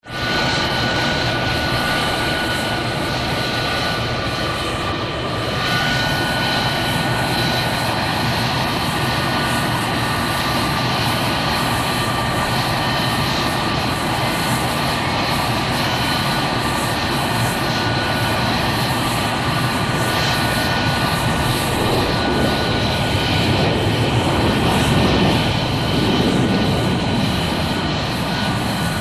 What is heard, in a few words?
Jetliner Taxi Away, airport